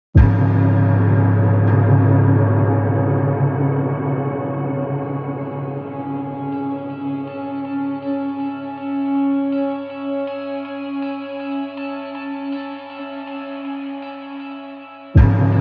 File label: music vents